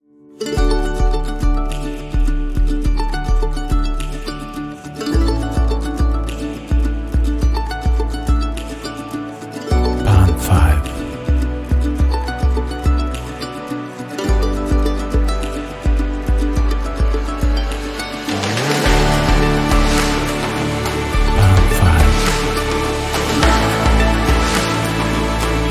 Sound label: orange cinematic inspiring pop